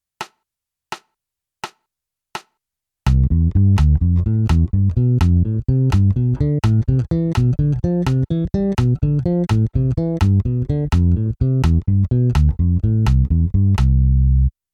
Triades Gamme Maj

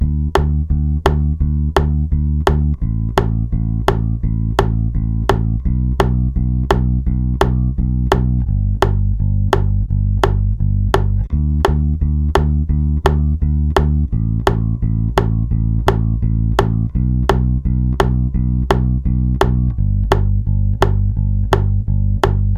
Basse Métronome Contretemps Croches 85

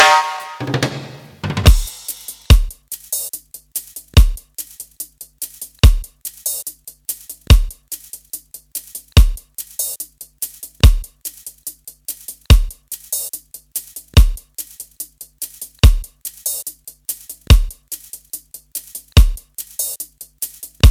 Batterie 144 Bpm

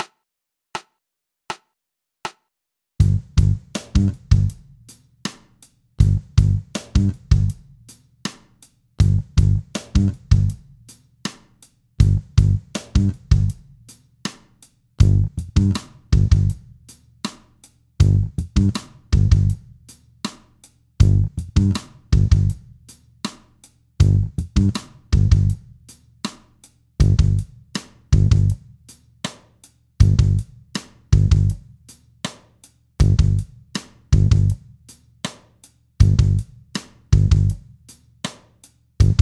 Précision Rythmique Basse Batterie 80 Bpm

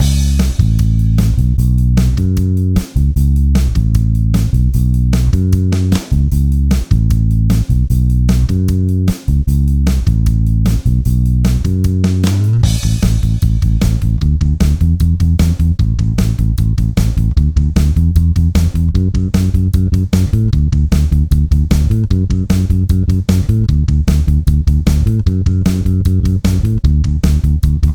Basse Batterie 154 Bpm

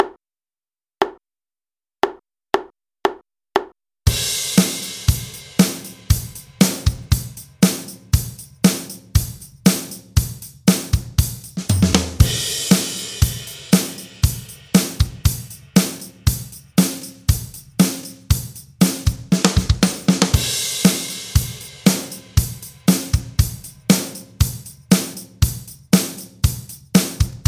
Basse Drum 118bpm

